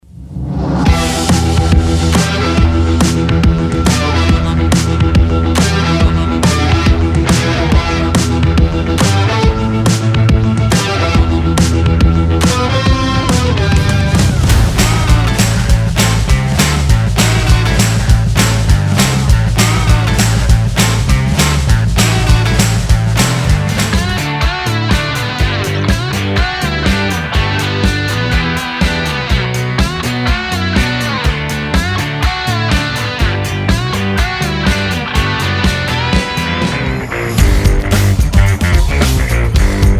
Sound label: Rock Instrumentals